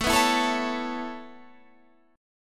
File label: Db Minor 7th Sharp 5th A, chord